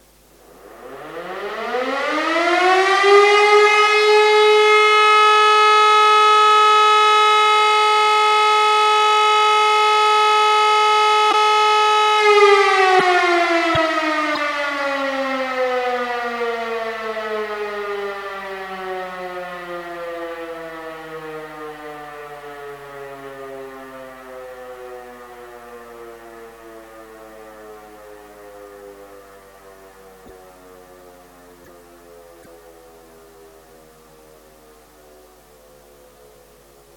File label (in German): probealarm, sirenensignale